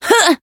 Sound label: attack; felmina